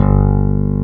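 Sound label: Clavinet soft G1; USB Soundscan vol.09; AKAI; Keyboards Old School; CLAVINET1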